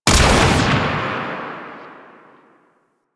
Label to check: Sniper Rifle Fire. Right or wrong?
Right